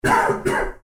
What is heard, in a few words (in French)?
Toux, Maladies; bruitage